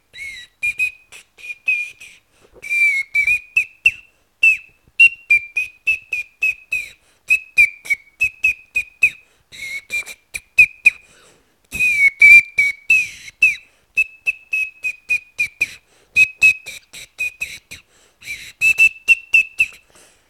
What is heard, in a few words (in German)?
alle voegel